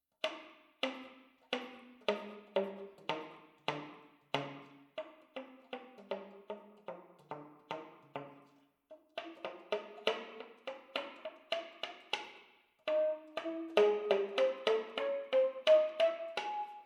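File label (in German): alt, Slap, NormalerSlap; Secco Slap; Open Slap. Alt; saxophon; spieltechniken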